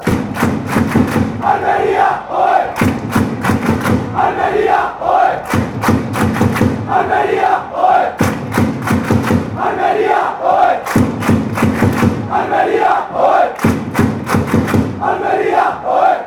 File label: almeria ole, chants